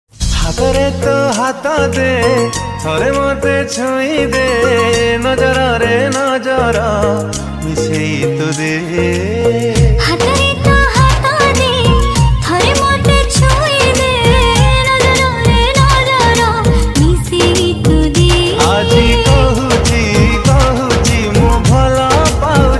Odia Romantic

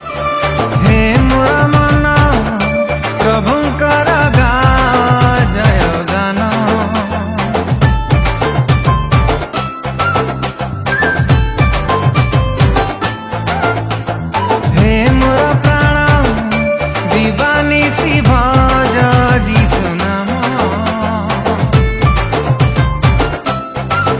CHRISTIAN SONG

